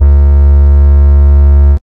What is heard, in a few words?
TRAXBASS, Hard & Loud Techno; USB Soundscan vol.01; AKAI